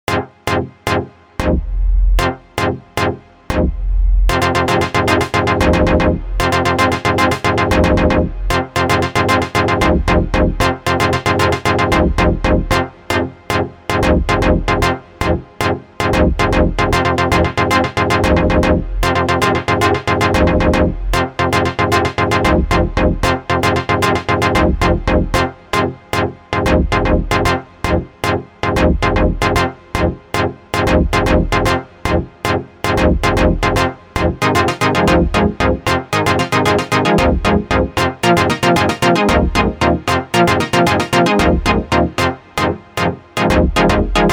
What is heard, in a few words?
echo; MIDIbox SEQ V4 Lite; Echo: another echo demonstration on a different sequence with an instrument which doesn't react on different velocity values.